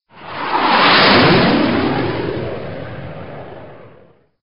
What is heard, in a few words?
jet fly by